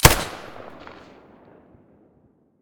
silencer stereo, weapons; vpo101

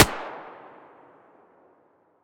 muzzle mp9 mono distant, weapons

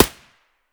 aps silencer mono distant; STALKER; IWP

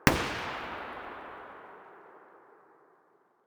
sig550 muzzle far; weapons

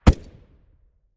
fiveseven silencer mono far, weapons

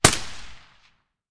ump45 silencer mono distant

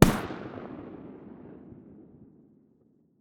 mdr silencer mono distant, weapons

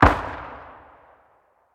rgm40 muzzle mono far

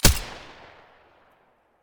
mdr silencer stereo, weapons

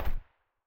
sa58 silencer mono far

